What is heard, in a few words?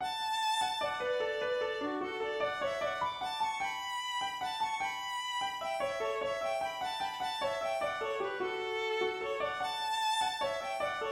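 jig, melody and 3 5 harmony; Goldenrod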